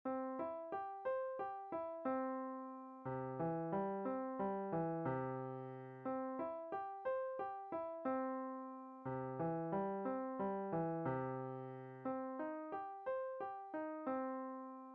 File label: Arpeggios Exercise